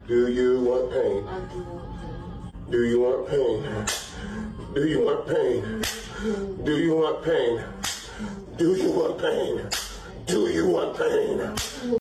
pain

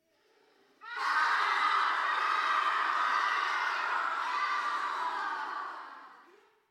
people crying from far far

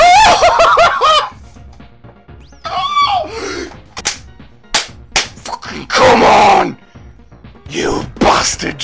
8th bit

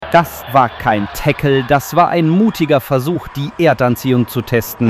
tackle fail

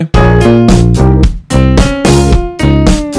piano thingy

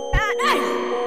ahh ayy

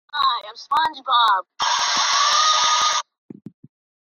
baaaaaaa; sponge bob; roblox

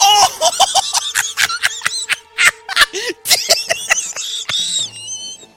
laugh

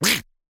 duck quack

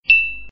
glass, glass click

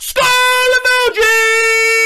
skull emoji loud

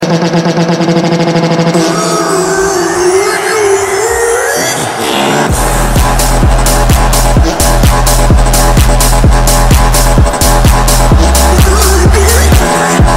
fartcore

